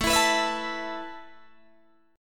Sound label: A Suspended 2nd A Guitar DADGAD